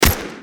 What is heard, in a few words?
Deagle